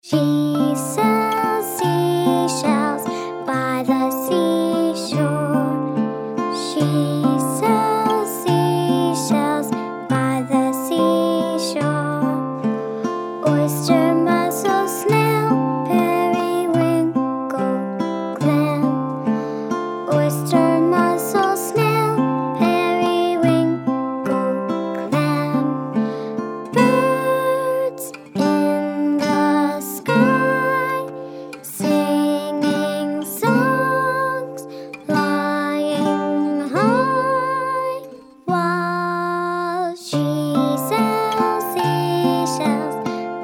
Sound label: She Sells Sea Shells song